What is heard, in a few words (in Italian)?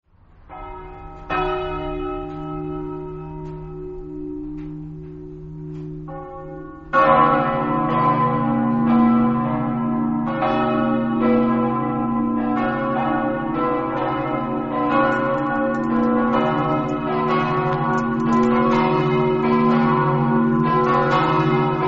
san daniele, campane